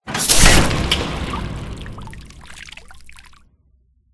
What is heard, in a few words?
scooper hit; Five Nights at Ronald's